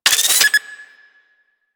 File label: Fortress Mine Deploy; Ambience; Warframe; Grineer Fortress